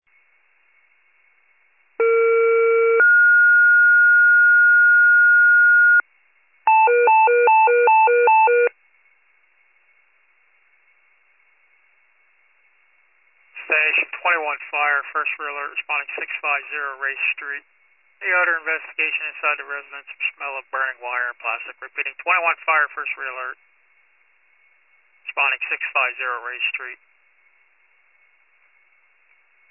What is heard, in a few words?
fire, pager alert